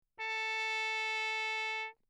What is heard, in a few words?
TRP MUTE Bach; Engemann; Perinétventiltrompete